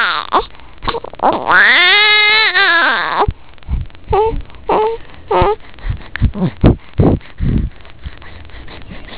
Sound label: cry